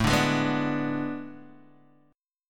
A Major 7th Suspended 2nd Suspended 4th, Guitar, DADGAD; chord